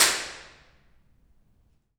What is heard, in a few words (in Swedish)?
kopparhammaren trapphus handklapp; Impulsrespons trapphuset i Kopparhammaren 2, HT2016 - handklapp; ortf